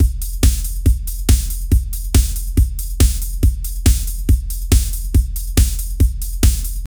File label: tense drumbeat with hissi; film score